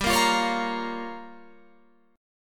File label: G Minor 7th Flat 5th, Guitar Open F; Gm7b5; strummed